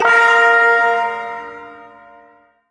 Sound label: Shawzin Chromatic Chord; Chromatic Chord K; Warframe; Duviri Ersatz Shawzin; Chords